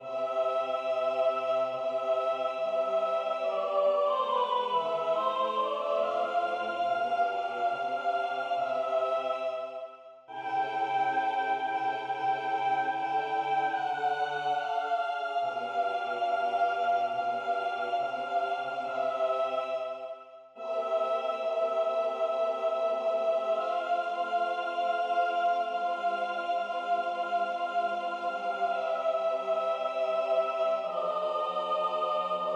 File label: Sanctus Sopran